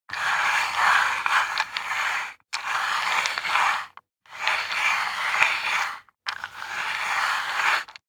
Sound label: DICE on Wooden Tray, Slide, Standard, 6 Six Dice; Samples; ArticulatedSounds; DICE Sfx Party (Extended)